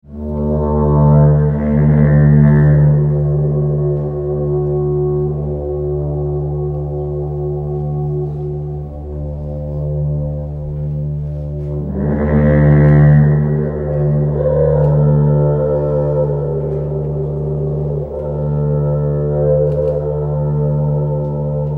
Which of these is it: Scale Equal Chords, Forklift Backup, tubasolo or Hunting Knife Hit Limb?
tubasolo